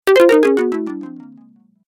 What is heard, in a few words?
Falling Fail Fast, video game